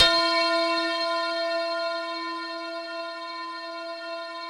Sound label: RESO METAL E4, Yamaha VP-1; Music Store; 90's Sample CDs